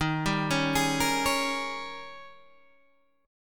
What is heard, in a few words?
D sharp 11th D sharp, Guitar, Open G